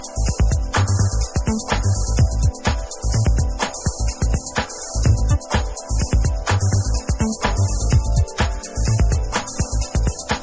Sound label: Instrumental